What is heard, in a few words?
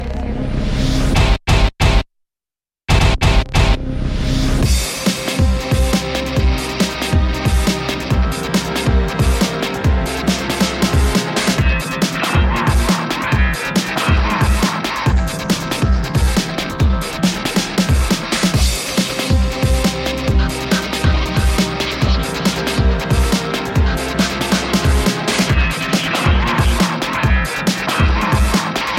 Police Remix